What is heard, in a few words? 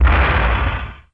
Collision Ext